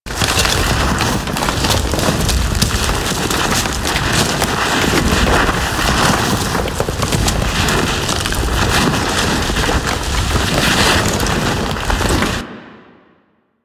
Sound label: Predator Burrow Snow Loop; Warframe; Hunting Critters